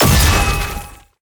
attack jump heavy; bloom minigame

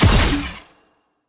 attack dash heavy; bloom minigame

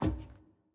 Footstep Metal Stairs

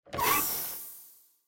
Pipe Flip

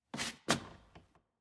Footstep Wood Rotate

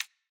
Safe Tick

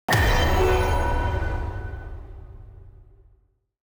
ui button click; kindred; spirit blossom

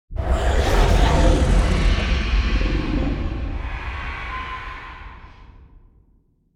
Cutscene Intro; bloom minigame

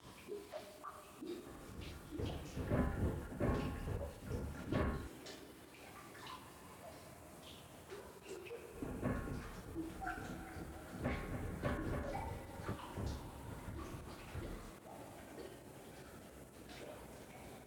Basement Drains

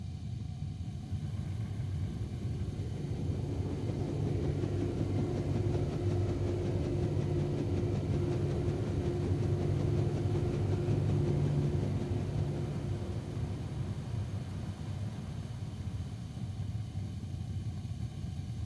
Basement Heater

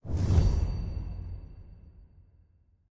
Easter Egg Enter; Eggs

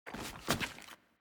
Footstep Glass Rotate

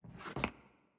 Footstep Wood Rotate

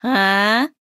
Nonverbal Tease; Aurora

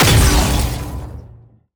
Attack Jump; bloom minigame

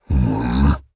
Nonverbal Annoyed; Ornn

